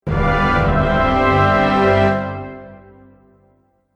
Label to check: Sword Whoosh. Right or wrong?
Wrong